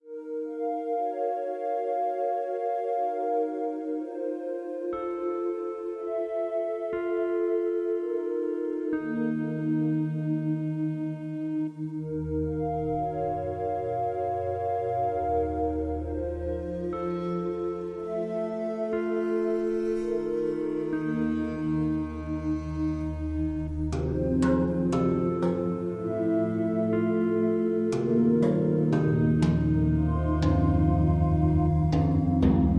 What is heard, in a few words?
Metaphysical Drone